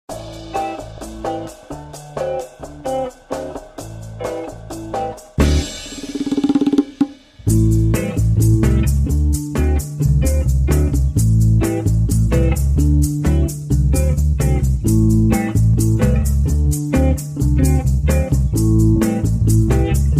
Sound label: Waiting Room Music